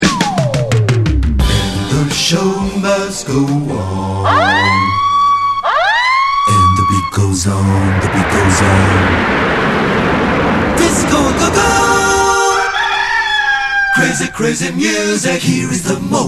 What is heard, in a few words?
jingles